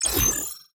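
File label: ui character upgrade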